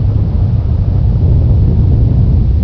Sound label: quake; XDM; ambience